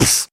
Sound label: Beatbox Snare, Human Beatbox